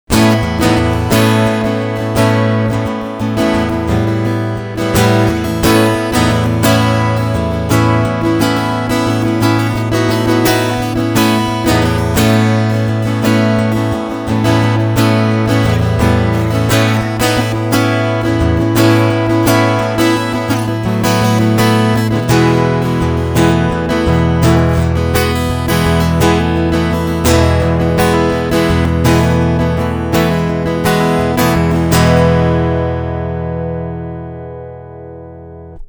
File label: Strumming Flat EQ